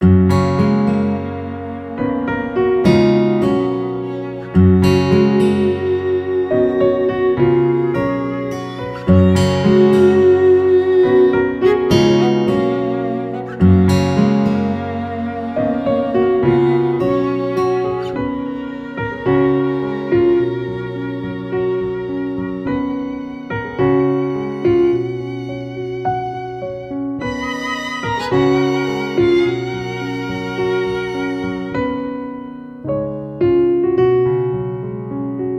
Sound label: bgm